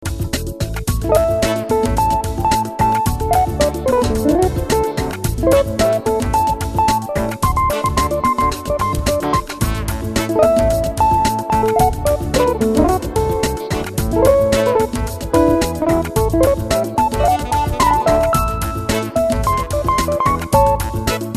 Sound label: Soul